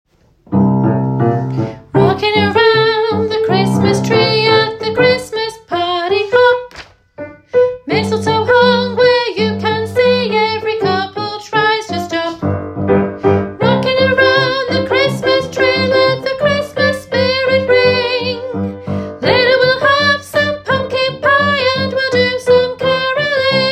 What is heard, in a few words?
bass